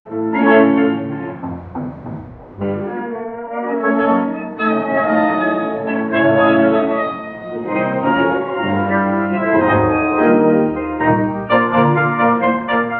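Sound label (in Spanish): música, classical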